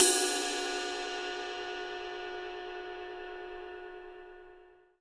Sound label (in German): Klangcymbal